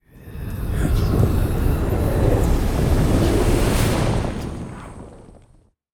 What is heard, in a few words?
near Win; goldengrimoire